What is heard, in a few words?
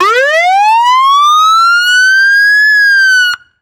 Truck Fire Truck Siren Electric Siren; transportation